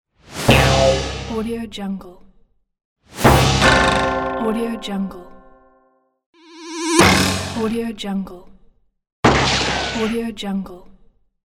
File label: comedy strike; comedy strike pack